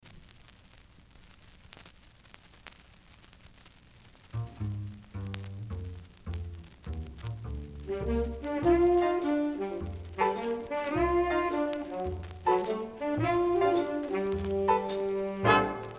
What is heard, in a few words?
Jazz Unfiltered